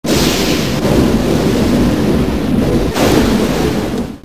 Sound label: thunder